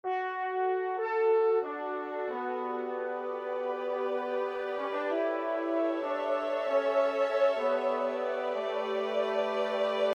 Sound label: Transition mellow